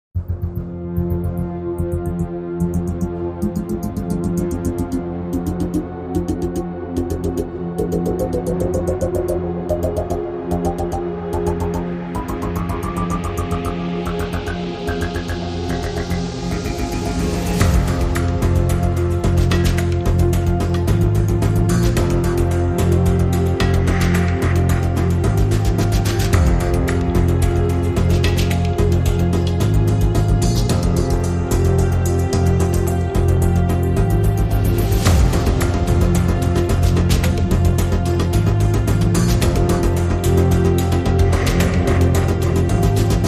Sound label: No Lead Melody